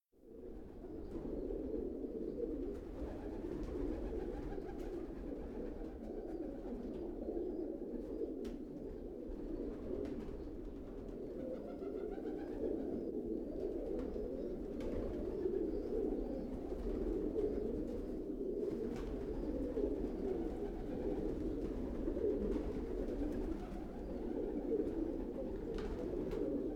General Zoo; KMP Film Video Sound Effects

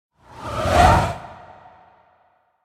Whoosh Scream By Passing Ghostly; Hollywood Edge; sound designer's toolkit v2

